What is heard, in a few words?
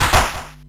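Battle Appear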